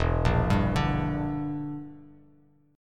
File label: E Minor 7th Flat 5th E down Bass Standard; arpeggiated